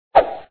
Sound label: Swing; Dad 'n Me